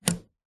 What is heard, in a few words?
household mains plug australia pull out remove wall socket; zapsplat